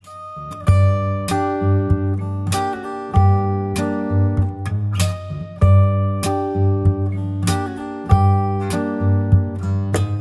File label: fingerstyle guitar cover; ringtone